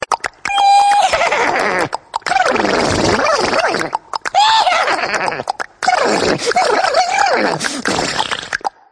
Caballo loco, ringtones graciosos; animal

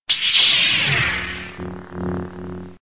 Espada Laser

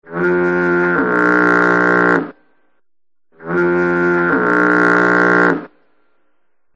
Sirena de niebla; alarmas

